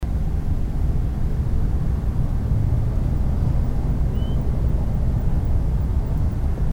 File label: Swainsons Thrush; NFC Home MD